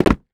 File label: Footsteps Wood; HauntedBloodlines; SFX Producer